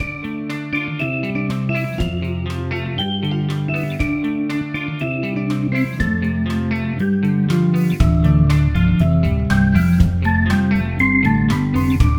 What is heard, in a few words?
menu music; Bus Driver